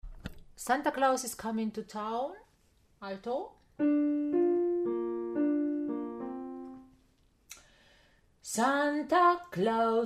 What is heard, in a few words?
Alto